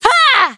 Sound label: Basic Attack; Battlerite; Nomad